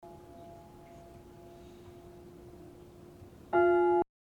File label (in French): cloche; Tarascon